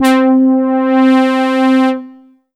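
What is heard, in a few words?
Yamaha TG500 Brass Synth 2 C4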